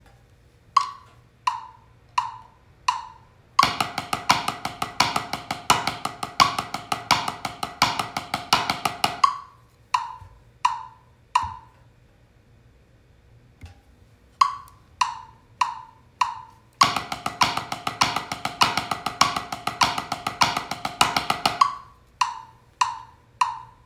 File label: paradiddles, drums